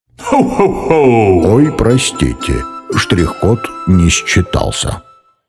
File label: barcode error